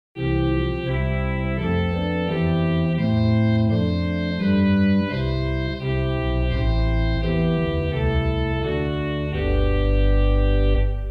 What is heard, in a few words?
Sw Trompete Gt Bourdon Spitz Ped Bourdon Nacht8, organstops; First Baptist Riverside